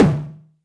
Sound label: Tom High